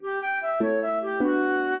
minuet, flute harp

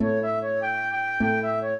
trio, flute harp; Mozart

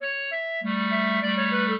clarinet; Mozart

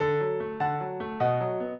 minuet, piano; Mozart

